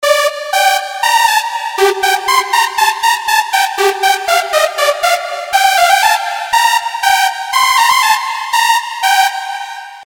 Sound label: lead